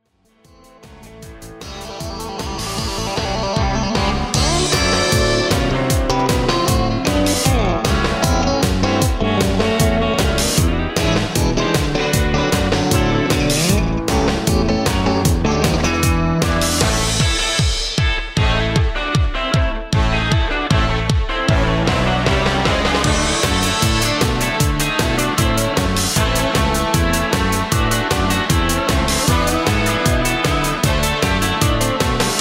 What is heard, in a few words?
12 bar Rock